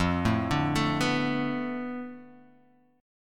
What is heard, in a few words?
F 6th Flat 5th F down Guitar Open F, chord; F6b5 arpeggiated